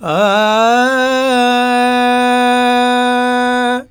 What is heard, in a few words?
Vox, Vocals Male, World Pop; Sonic Foundry (Sony Creative Software)